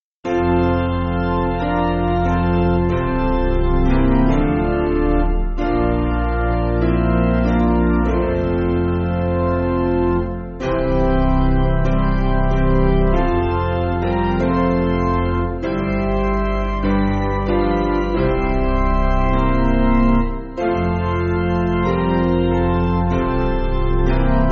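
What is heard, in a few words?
Piano, Music Backing Files for Churches; Fillmore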